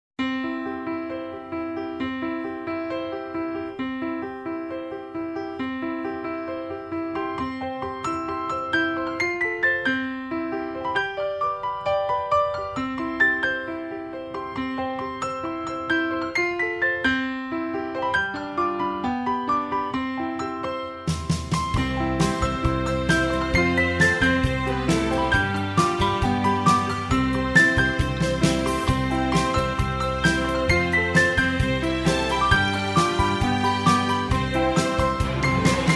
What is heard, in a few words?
piano